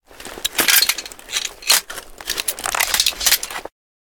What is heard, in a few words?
tihar unload; metrocraft; weapon